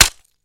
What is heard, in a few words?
Staff Break Quick